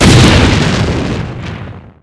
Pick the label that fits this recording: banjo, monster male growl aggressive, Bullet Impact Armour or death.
death